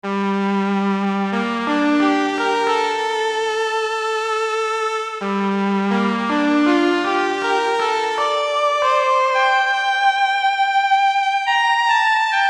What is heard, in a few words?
ARP Fuzz Guitar